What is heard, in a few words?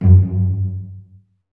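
STRINGS SECTION 5; Roland - LA Composer vol. 1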